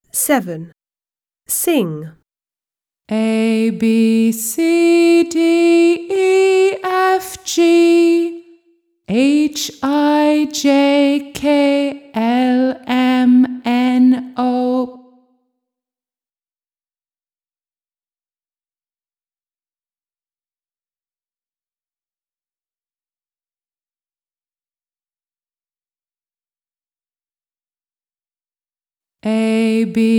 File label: song ABCDEFGHIKLMNO; British; My alphabet book; Smart Book 1